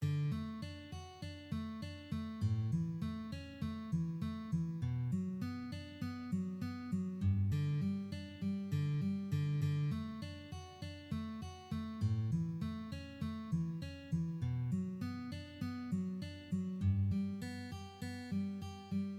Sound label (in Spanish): acompañante, guitarra acustica